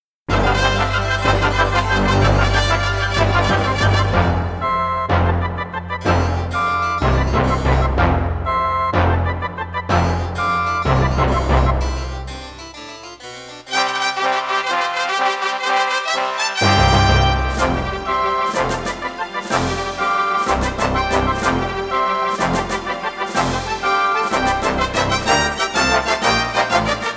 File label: Step Step March; Sword & Sorcery